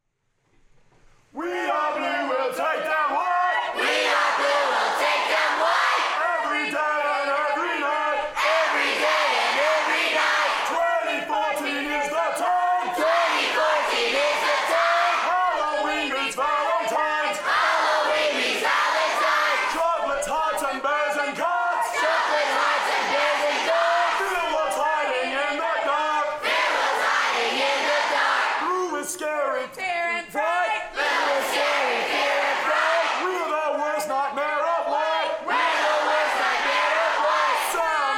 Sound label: Halloween Cheer